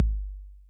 Kick Max Tone Max Decay, 808

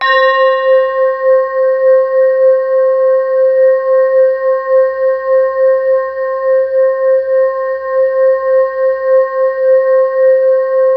BELL EPIC; MORPHEUS

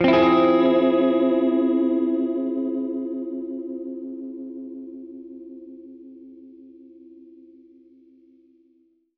Gretsch Chord Fmin[maj7], Guitar Chords; undercover samples

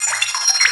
Trance Loop; Mo' Phatt; Digital Sound Factory Mo' Phatt

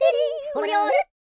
YODEE, World Voices; ILIO; Vocal Planet

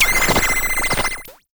Cwejman S1; Litter; DevSnd Cwejman S1 - RD

